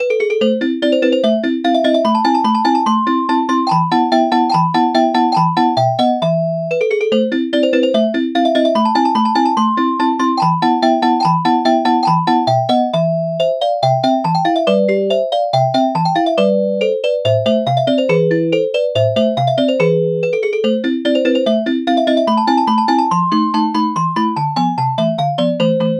celesta

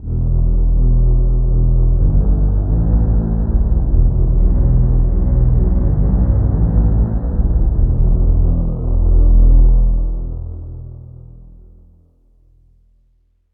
halo pad bass

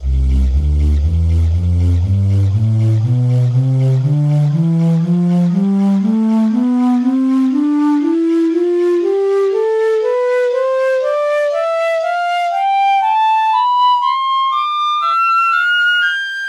piccolo; tonleiter

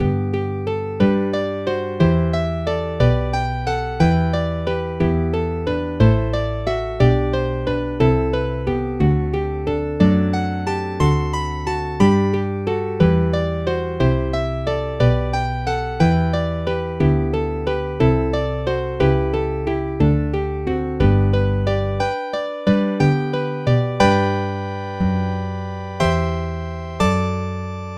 acoustic bass bach

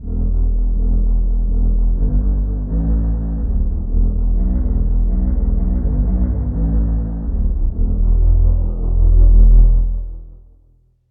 polysynth; bass

